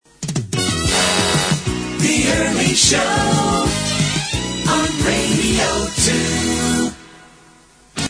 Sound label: jingle